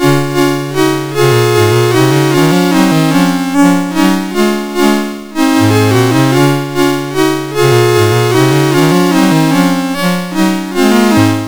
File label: Chorale, MOS6581R2